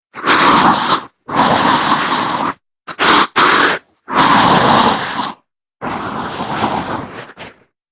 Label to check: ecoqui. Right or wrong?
Wrong